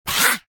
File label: Hiss; Barotraumatic; Shrimpbrut